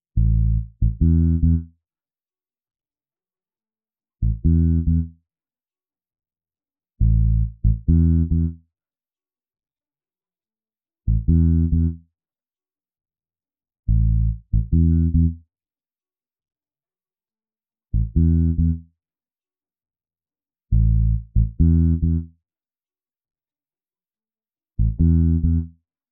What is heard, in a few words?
music home base